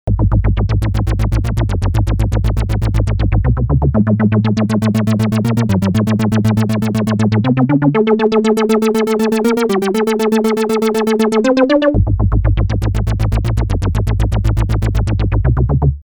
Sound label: synth